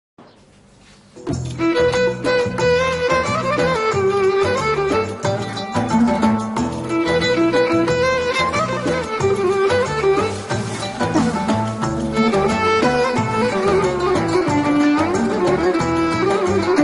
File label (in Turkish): fon muzigi; akasya duragi